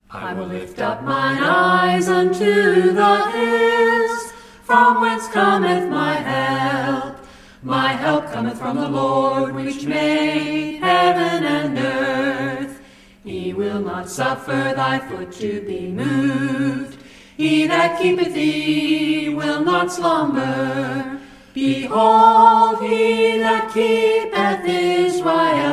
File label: a capella scripture song